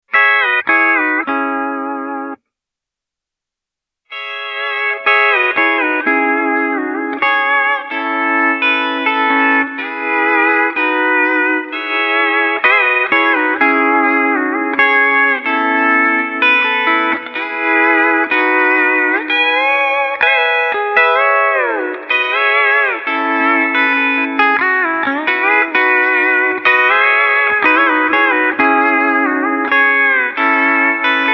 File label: Plate Studio Pedal Steel, reverb